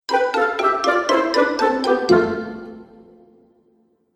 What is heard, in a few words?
Cartoon Fun Musical Element